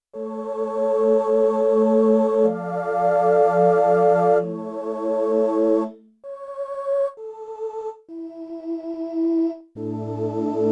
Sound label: Vox, Yamaha TX16W; Combo Performance 2